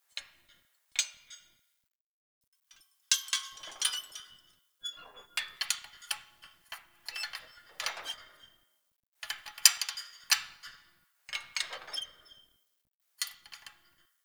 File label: Metal Hinge Planter Movement Loop; Warframe; Ambience; Nineteen Ninety Nine